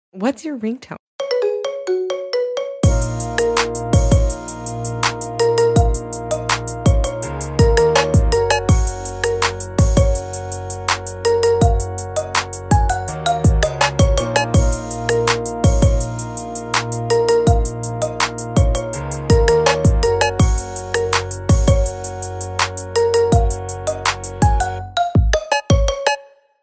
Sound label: marimba ringtone